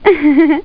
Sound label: giggle; Battle of the Superstars